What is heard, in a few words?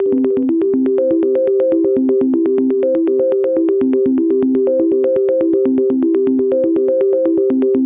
122 BPM Arps C maj A min Tonic G; Flux Arps; mmb studio melodic